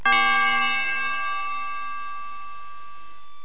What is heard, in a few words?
bell